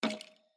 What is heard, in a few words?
REEL STOP; Jurassic Jungle